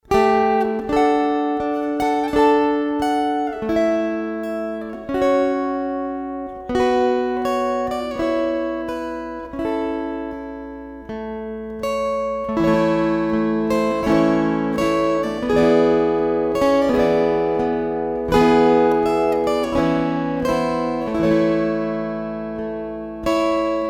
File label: String Choir